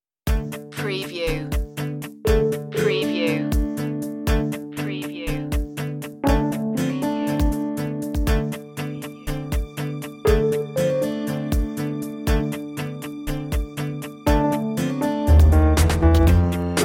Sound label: life style tv light comedy